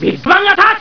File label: program error; dragon ball z